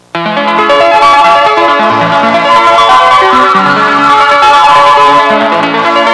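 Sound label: neat piano; Music; Sound Library 2000; Wayzata Technology; Music In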